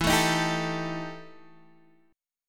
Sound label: E Minor Major 7th Double Flat 5th, Guitar, Open D; chord shape